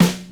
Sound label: hot snare single hit f sharp key